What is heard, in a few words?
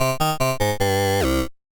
waveform a